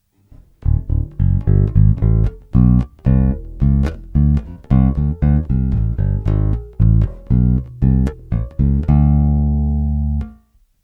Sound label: neck pu flat; Biarnel lowZ 3e2; Attiva a due vie; Neck pickup; Acero Occhiolinato